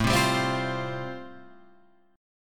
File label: A-Minor 7th Sharp 5th, Guitar Open F, chord; 9,7,7,7,8,7; Am7#5 strummed; JGuitar